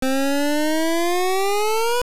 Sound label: Mini Boss Charge; Agahnim [OOS Mini-Boss 4] and Stalfos Reaper charging up a shot; Zelda Oracle